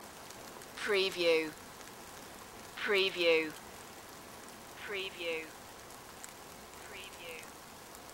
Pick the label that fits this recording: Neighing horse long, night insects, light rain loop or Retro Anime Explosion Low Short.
light rain loop